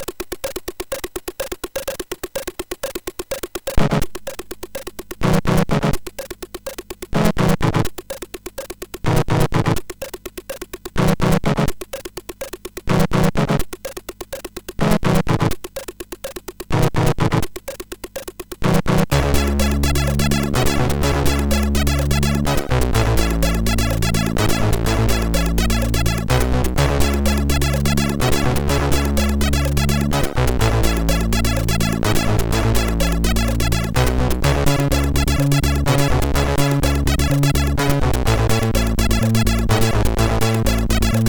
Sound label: Bassy Introtune, MOS6581R4; hvsc